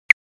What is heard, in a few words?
Claves; drums; e-drums